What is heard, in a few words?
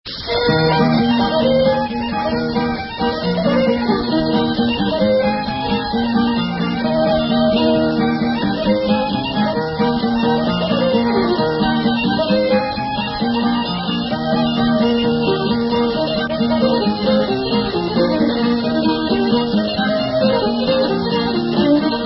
Hornpipe